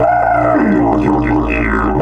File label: 120, Didgeridoo